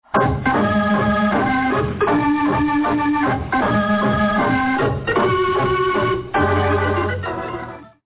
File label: Radio Soundclip EQ organ